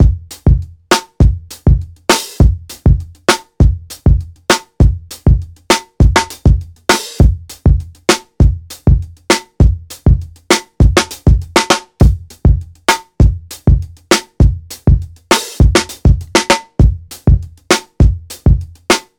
soca; music theory

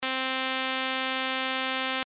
square Wave; A square wave results in distinct on/off modulation, perfect for maximizing the intensity of the effect.; Without LFO; Low Frequency Oscillators (LFOs)

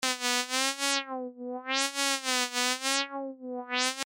LFO filter cutoff volume and pitch

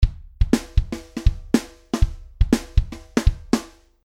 funk audio mixdown; making a beat

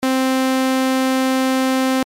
sawtooth Wave, analog synthesizers; Low Frequency Oscillators (LFOs)

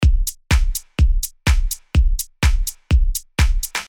house pattern; making a beat

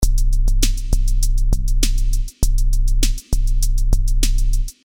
trap audio mixdown; making a beat

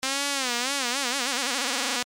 LFO Rate; Slower rates, for instance, can be used to create a slow, sweeping effect, while faster rates can create a rapid, pulsating effect.

